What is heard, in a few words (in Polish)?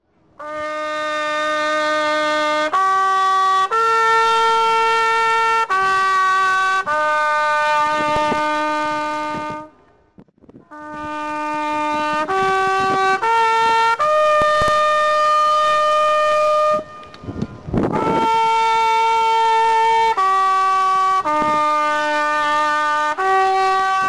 hejnal; poznanskie koziolki